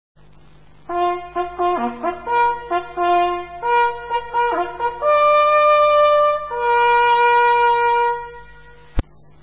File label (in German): sammeln der jager, Jagdhornblasen; Signale; Brauchtum